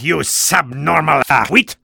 stabbed; tf2; freak fortress 2; gamblerv2

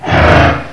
pain, monsters, face; svencoop; AoMDC

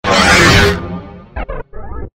gravity warp; tf2; freak fortress 2; lunar; moon

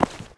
step; slender; chaosinsugunner

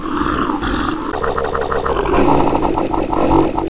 alert, monsters; svencoop; AoMDC; face

